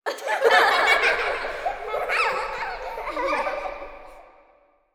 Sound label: children laugh